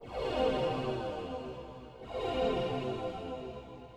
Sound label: Long Hallway - Ambient